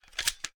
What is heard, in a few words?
shotgun insert